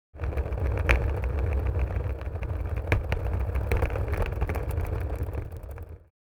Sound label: Suitcase dragging rolling on wood floor short